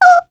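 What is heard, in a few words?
Damage, Korean; Mirianne; kingsraid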